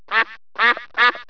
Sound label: DUCK